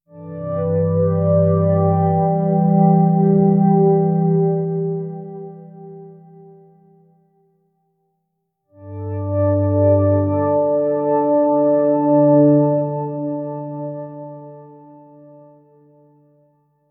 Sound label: a gentle and slightly, low strings